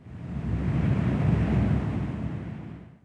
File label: WIND, wind